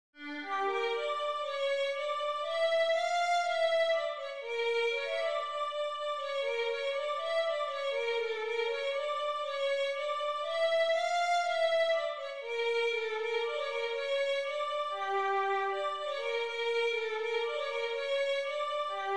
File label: bulgarish vln